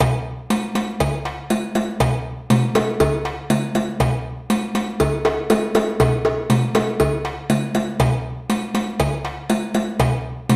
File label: Djolé Groove 120 bpm, djembe